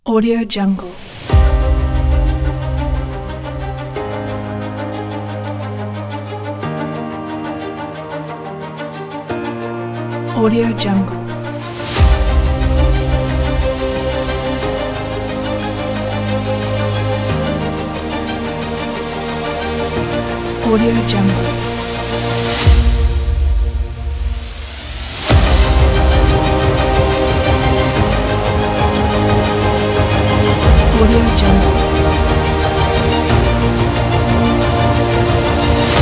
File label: in epicness; commercialmusicstudios